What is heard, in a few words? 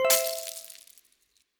bar filled; Skillzgaming; gems odyssey 92 desktop